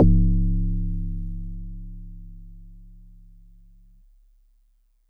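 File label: Marimba DX100 C#2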